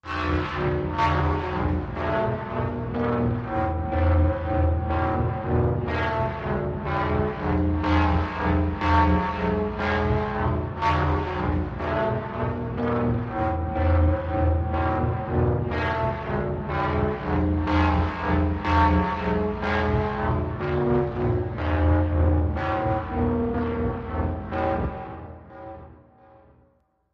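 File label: Spring Leveler Machine, metallic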